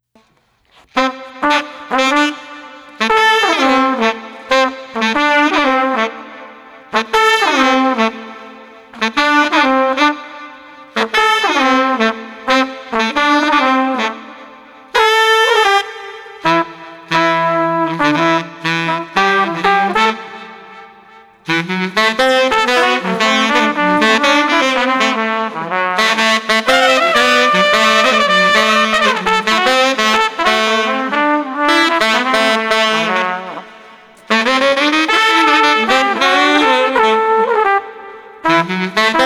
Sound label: Sax Trumpet Chill 120